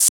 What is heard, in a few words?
Shaker; FLPRO